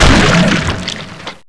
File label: die bloater